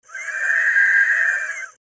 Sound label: monster scream